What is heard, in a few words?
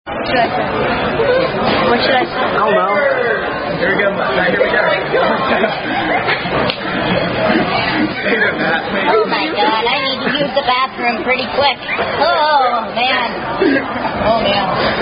Happy Birthday (Tune Alouette); Red Robin